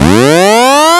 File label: annoying clipped alarm; pyledriver